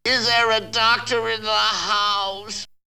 Vocal; ZERO G - Datafile DISC 1

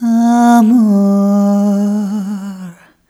Rhythmic G

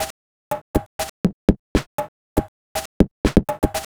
Shuffle Beat, Electronic Beats; Sonic Foundry (Sony Creative Software); Myths of Technology

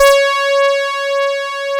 BASIC OB C#5, OB Synths, Analog; E-MU Formula 4000 Series Vol. 3; Analog Odyssey

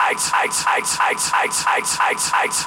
Ice; Sonic Foundry (Sony Creative Software); Hydroponic Hip-Hop

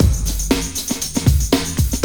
BEAT, Beats 113-123bpm, Hip Hop; E-MU Formula 4000 Series Vol. 1; Hip Hop Nation

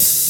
HH, Dance Mega Drums; Best Service; HH HIP

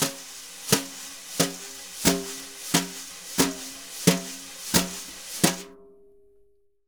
BRUSH, Jazzistic; Univers Sons

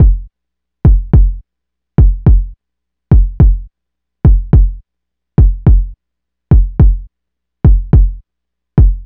Straight Kit, World Pop Beats

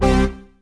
SYN syntho; Best Service Gigapack 1

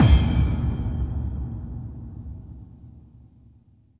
BD-FX, Dance Mega Drums; Best Service

